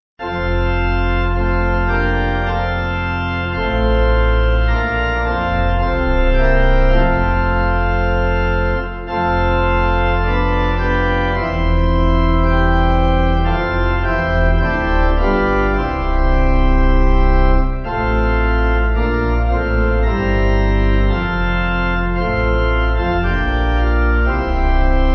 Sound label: Weary Of Earth, Pipe; Langran